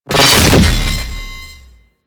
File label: Sentient Shield Lock; Warframe; Symbilyst; Abilities